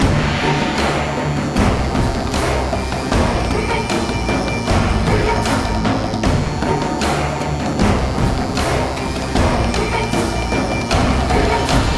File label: Storm; The Witcher 3 Wild Hunt